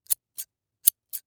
Household Scissors Open And Close Multiple

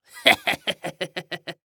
Human Pirate Laugh

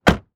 Auto Police Car Close Door Exterior; Transportation

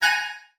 Beep High Pitch

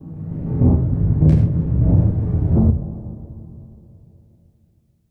Transition Delay Medium

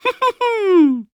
Cartoon Human Male Laugh; Pillsbury Doughboy

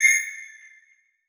Multimedia Internet CD-Rom Flash Short

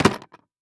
First Aid Kit Metal Setting Down On Concrete; Emergency; AdobeCC

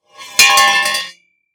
Impact Exhaust Pipe Drag And Drop On Cement

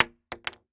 Weapon Bullet Shell 22 Dropping On Hollow Wood; AdobeCC

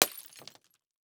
Crash Wine Glass Drop And Break; crashes; AdobeCC

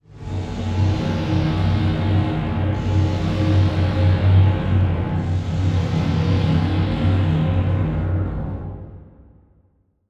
Transition Stab Dark Medium; Production Element

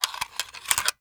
Weapon Gun Movement; AdobeCC

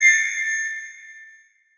Beep Long; Adobe; Multimedia Internet CD-Rom Flash

